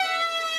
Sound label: violin